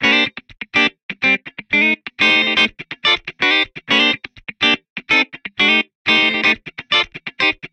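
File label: Guitar Funk C major 124bpm; Guitar and Bass; Ableton; Factory Packs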